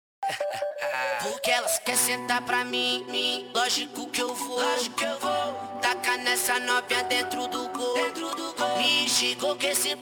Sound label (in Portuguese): pancadao funk